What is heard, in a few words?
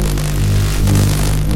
Loop Lightning; KriptoFX; Realistic Effects Pack v1